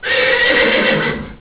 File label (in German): pferd; Es war windig